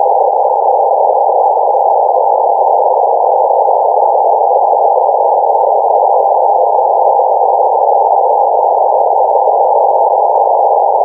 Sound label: CW mode; Meteosat-11; received the LRIT downlink signal on 1691 MHz